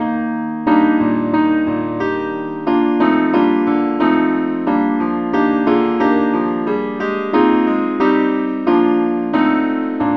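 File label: keyboard only, key E flat; saintthomas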